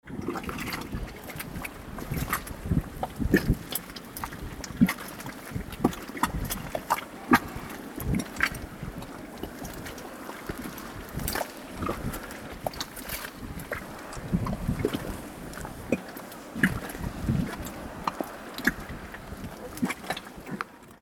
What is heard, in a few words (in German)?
Myvatn Blubberndes Schlammloch